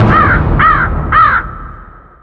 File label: ENCHANT FAILURE